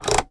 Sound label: van fan speed control dial move mercedes sprinter